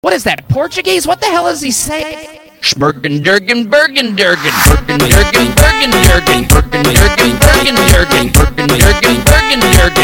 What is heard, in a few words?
brewstrew funk